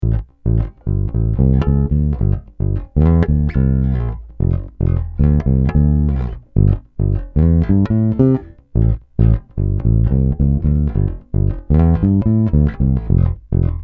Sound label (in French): Did M Passif, basses